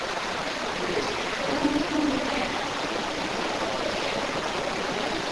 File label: ooh ooh yeah yeah